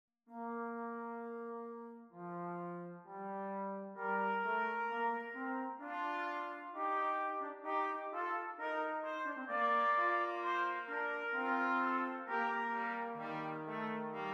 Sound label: canzona sopra ave maria, brass quartet